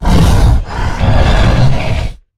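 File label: Stealth Attack; Evolve; Goliath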